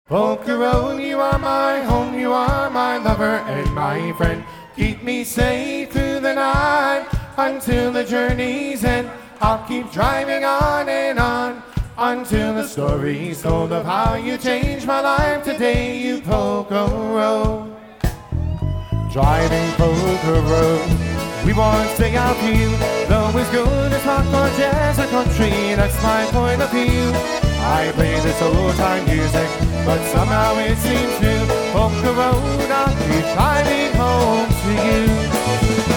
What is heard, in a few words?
Polka Road Polka Live Jam